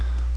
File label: coin